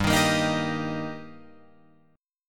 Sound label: G Minor Sharp 5th G Guitar Open F, chord; strummed; JGuitar